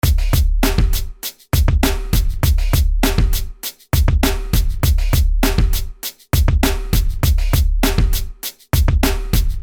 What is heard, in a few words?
Drums Orig